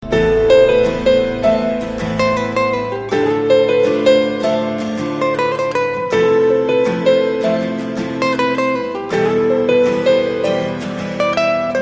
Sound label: Guitar Tune